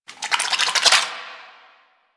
Cave Insect; Warframe; Quests; TheNewWar; Ambience; Drifter Camp; Insects

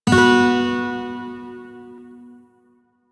Shawzin Chromatic Chord; Warframe; Lotus Shawzin

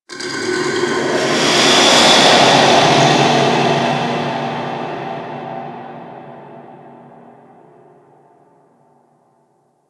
Ambience Hub One Off; Warframe; Tenno

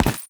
Step Default, Foley, Footsteps; Warframe; Lasrian; Lasrian Soldiers; Lasrian Butcher

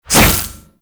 Briefcase Scythe Whoosh A; Crp Briefcase Scythe

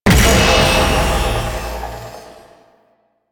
Buff Ability Cast; Warframe; Duviri; Duviri Dax; Abilities; Ashigaru

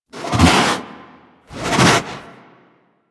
Tame Idle Alert To Lie Side Sniffs; Enemies; Warframe

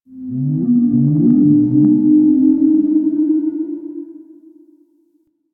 Exterior Eerie, Ambience; Warframe; Duviri

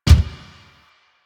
Gear Shift Up, Motorcycle; Motorcycle Ninety Nine

